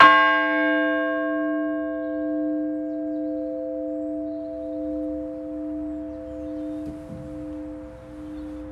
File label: cowfold, bells